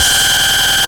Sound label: FX; AMG Megabass REMIX! VOL-2